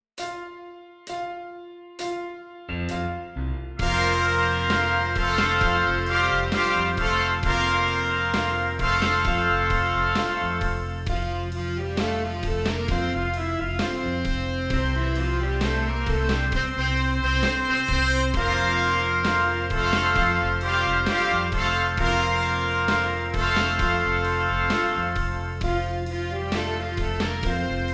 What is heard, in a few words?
Exercise